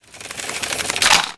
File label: gunner reload